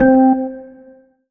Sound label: Kawai K5000W Perc Org 1 C4